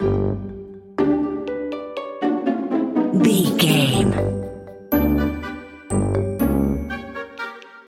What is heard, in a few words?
sneaky lurking loop